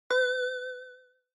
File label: Single Accent High Short